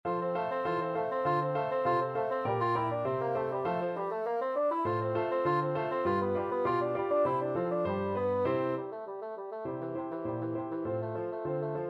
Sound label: queen of sheeba, bassoon